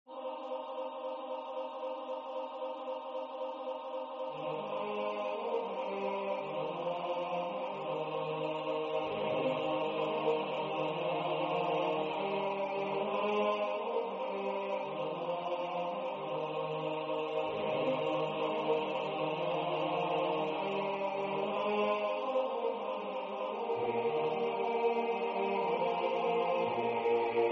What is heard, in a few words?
tutti; Fyrreskoven